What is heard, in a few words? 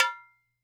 DUM, Percussion; Sampleheads; NYC Percussionworks; GJ PERC VOL2